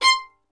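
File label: STR violin; Miroslav Vitous; Solo Instruments VOL-2; Solo lnst. II; Vln Misc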